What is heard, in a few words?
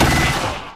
mag burst